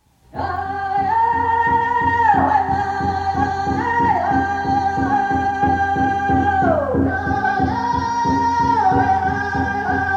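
American Indian spiritual songs & traditional chants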